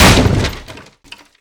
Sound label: Crate; Totaled; XBOX